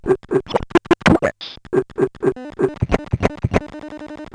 Bent Talking Teacher, Circuit Bent

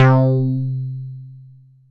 MULTIBASS, Dance Mega Jungle Rave; 90 s Sample CDs; Best Service

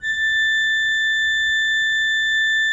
A5, Cathedral Organ; ILIO; Propeller Islands Cathedral Organ

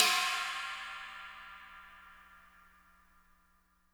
china cymbal

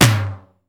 SDS7 KIT, Elec. Percussion; E-MU Producer Series Vol. 2; More Studio Essentials; Composer

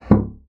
bassoon slap; Xsample VOL-6; C BASSOON

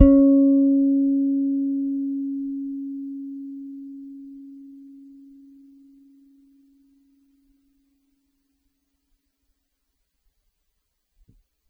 Ds C#5 soft, Bass, Pedulla Thunderbass; Giga Samples Collection

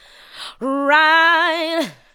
ADLIBS

